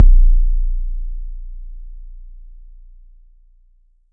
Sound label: blowme, Kicks Heartbeats